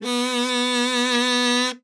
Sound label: KAZOO C4; Xsample VOL-9